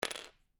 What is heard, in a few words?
Lego Single Lego Piece Drop On Floor; Miscellaneous Toy Sound Effects